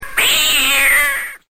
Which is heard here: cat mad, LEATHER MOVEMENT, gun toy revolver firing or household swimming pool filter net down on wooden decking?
cat mad